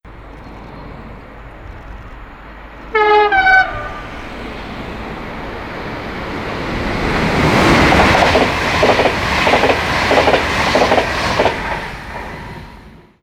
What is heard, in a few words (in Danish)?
tyfon; DSB EB Tyfon; DSB EB 3206 tyfon og forbikørsel.; Marslev